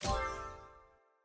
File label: generic click